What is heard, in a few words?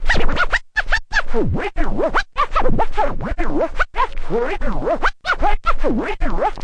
turntable hey dj, Scratching and Turntablism